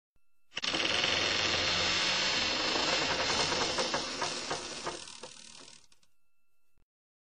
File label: Creaking door